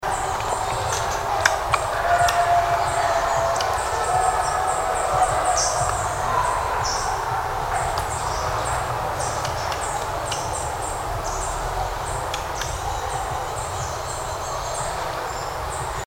Eurasian Red Squirrel Sciurus vulgaris; Hentiy

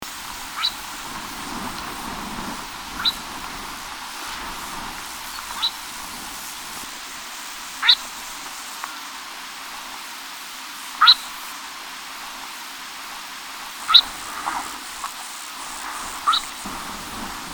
Tarbagan marmot Marmota sibirica; Hustai

